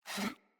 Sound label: Pet Alert Snort; Catbrow; Pet Ship